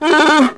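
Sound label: Wookiee female hit; Star Wars Knights Of The Old Republic I; XBOX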